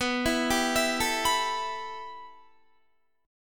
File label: B 7th Suspended 4th Sharp 5th, Guitar; 18,19,19,21,21,19; arpeggiated; JGuitar